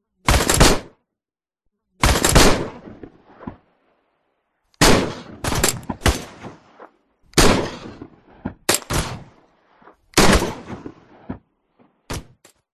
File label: guns shooting various designed; Audio Mangler